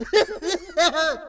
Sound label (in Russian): smeh